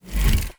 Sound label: puzzle open